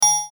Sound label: Chime Metallic; Chimes; Blastwave